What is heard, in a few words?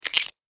Insect Claw Snap, Insect, Claw, Snap, Movement, Bug